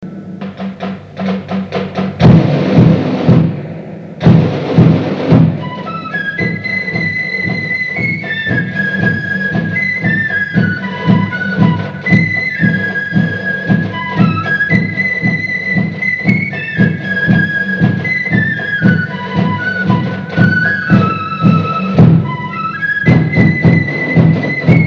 Loyalist flute Band

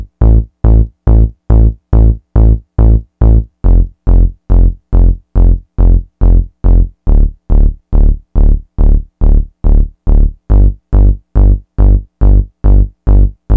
bass line

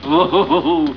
OOHHOO; Ooh-hoo-hoo-hoo!; Mouse Hunt

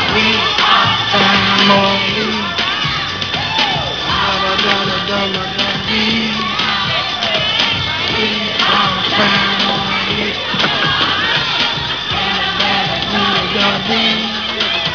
BIRDSING

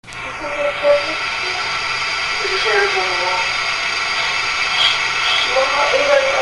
girl laugh, A Little Girl Laughing

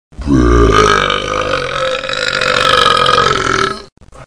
Bass Burp

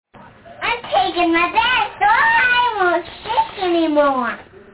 bath

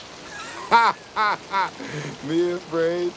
haha

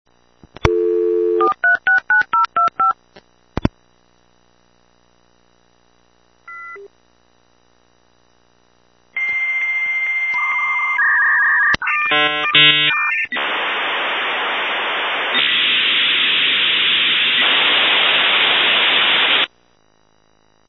dialing audible

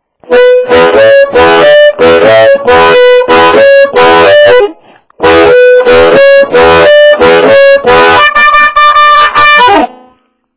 harmonica

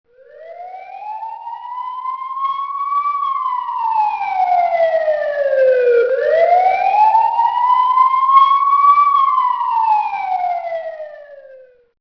Siren

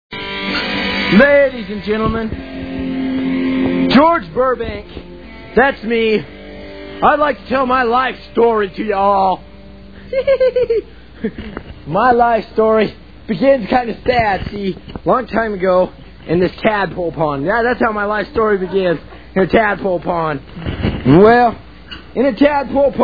bdum frog; B-DUM RADIO; tells his unbelievable Life story

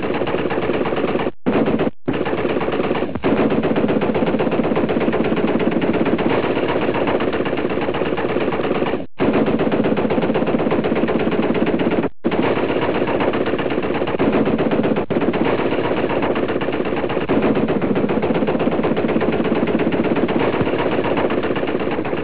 50mm gun

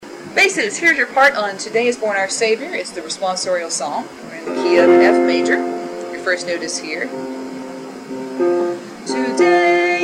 Bass

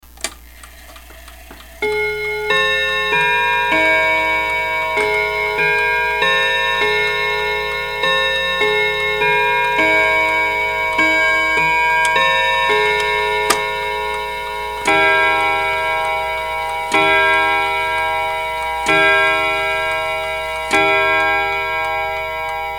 Deco Westminster

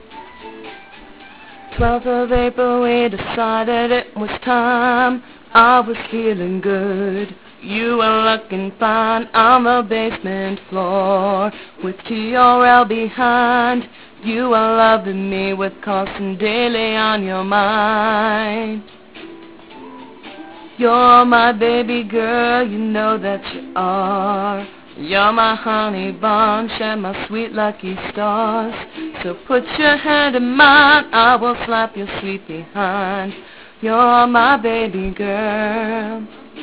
karaoke

